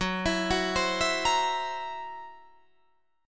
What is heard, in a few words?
Gb 7th Flat 5th Gb Guitar Drop D